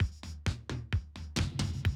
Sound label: 130, Classic DrumLoops